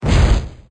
Fire

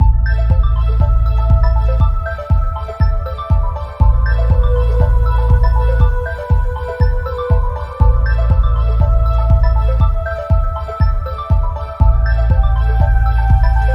dungeon Evil Palace

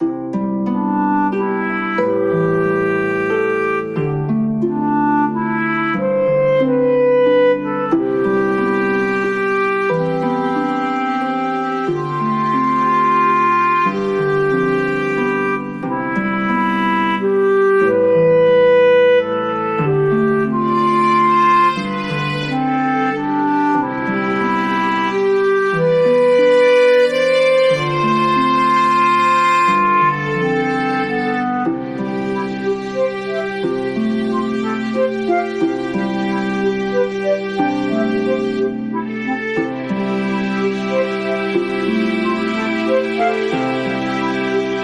Bgm Fantasy

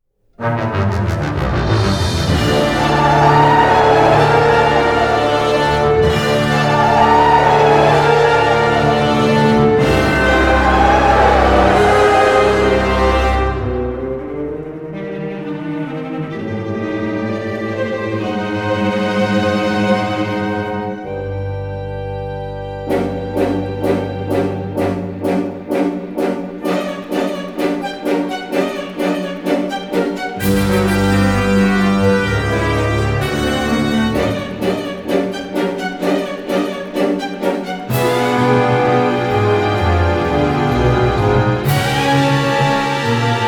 bgm

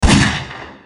pistol shot

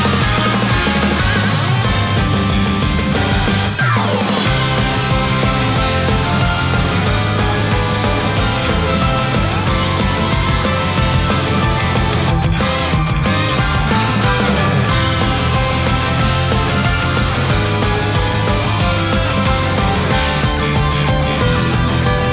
Battle

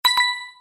bomb

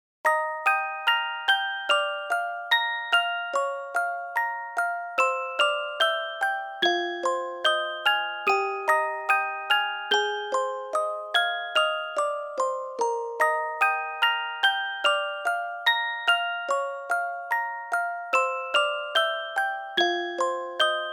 win

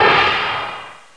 Annihilation; BlackAndWhite

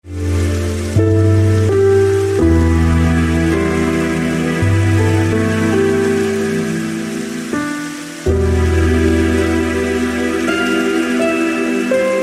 taiga; minecraft

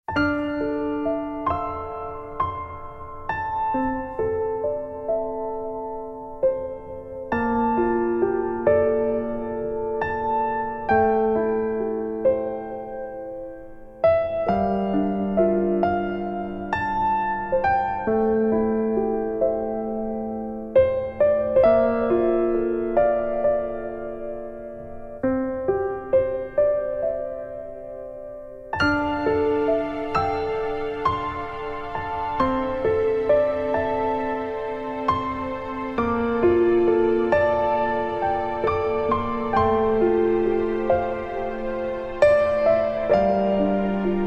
bgm

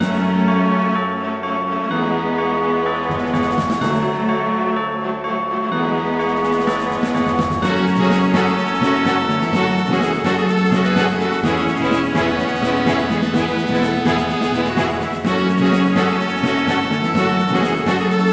win

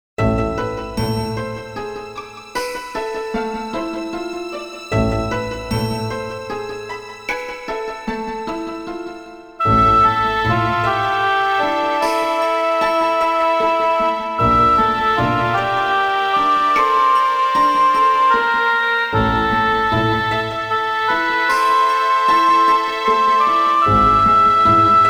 Upstairs; xinxin; MagicTowerofOil

